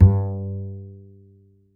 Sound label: A, upright acoustic bass, A string